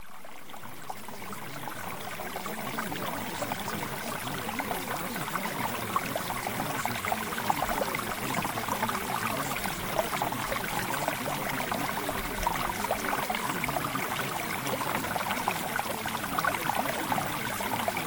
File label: Mountain Stream